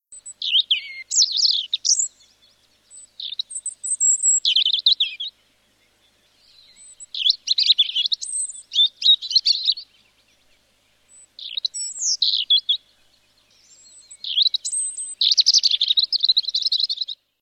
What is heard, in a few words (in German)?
Rotkehlchen